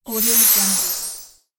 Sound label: magic spell whoosh